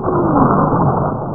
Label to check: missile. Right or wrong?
Right